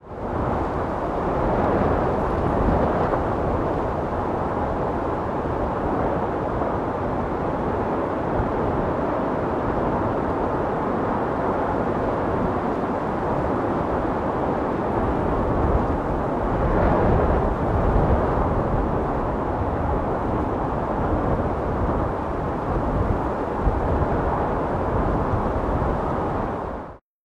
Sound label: Wind; Google Earth VR; Lotr